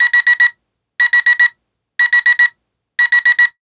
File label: Alarm; Alarms, Beeps